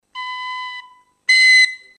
low B high B

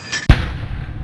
weapon

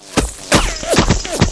explosion

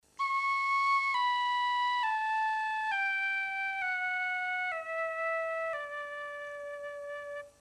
whistle

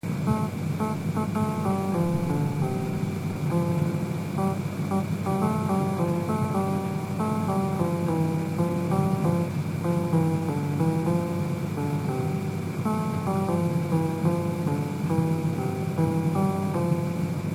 up up keyboard

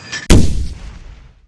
weapon

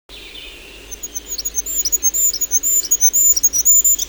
goldcrest, birdsong

